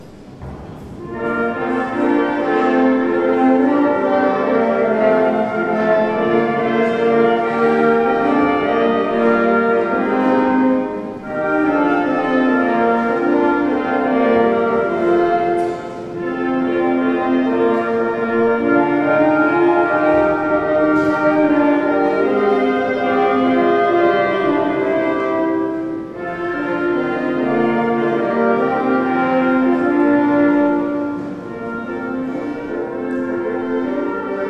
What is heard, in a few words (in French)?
Entrée Quintette à vent